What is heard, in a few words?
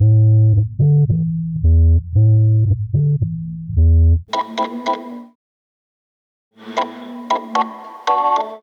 keys, Phonky loops